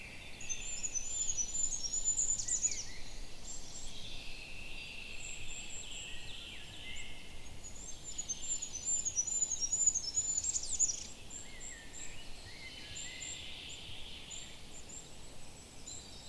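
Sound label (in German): wintergoldhähnchen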